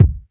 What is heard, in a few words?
kick; eddyflux; crate bd; dough-samples